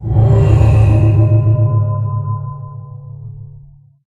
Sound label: power, beacon